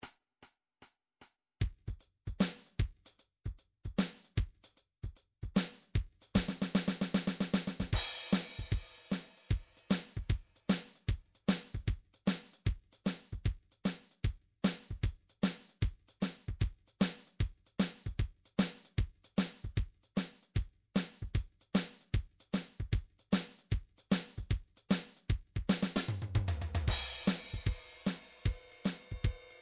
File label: blues